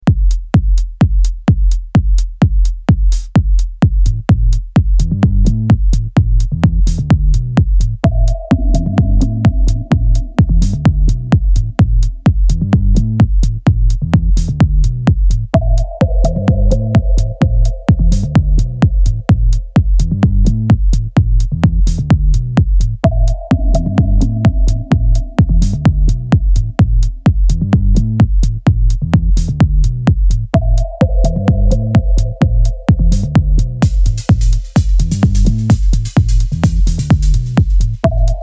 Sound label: House Deep Vocal House, 128